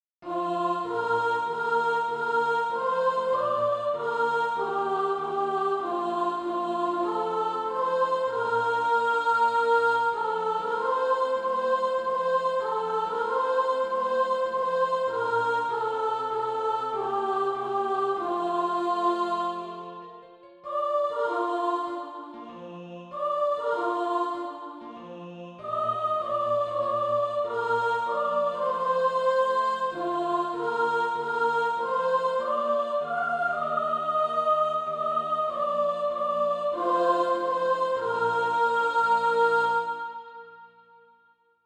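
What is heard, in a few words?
soprano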